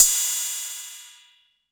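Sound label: TR606 Cymbal, Roland TR-606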